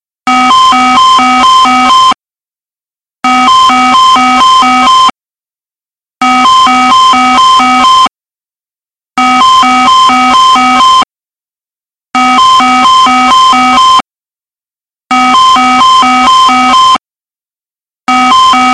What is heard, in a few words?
autopilot disconnect modern